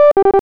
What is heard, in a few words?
alert